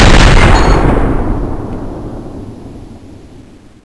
fire in slow, weapons

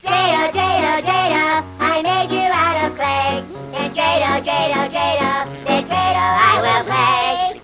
dradle chorus; soxmas

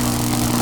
tesla fire; hunger

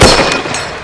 garand reload clip ding; dod

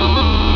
slave pain; gearbox; aslave

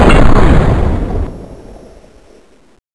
fnh fire; weapons

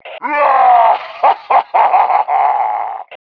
WOOHOO

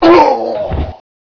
die simple; gearbox; demoman

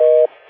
phone busy

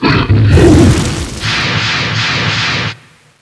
revenant fireball; cstrike; npc revenant; revenant zbs

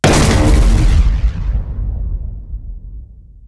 kill bonus; cstrike; mw2

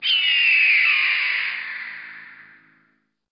hawk

